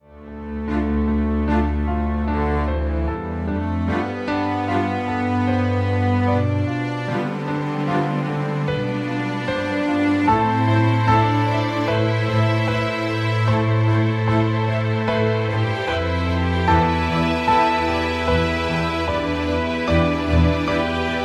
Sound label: Sentimental melody; MIUI12